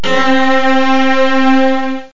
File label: strs, strings; Sounds Terrific II; Weird Science